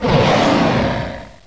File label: Smilodon Attack